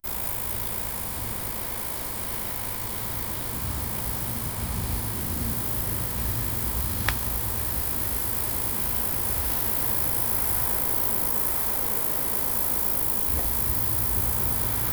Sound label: ambient field recording